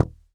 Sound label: noise muted slap, swag bass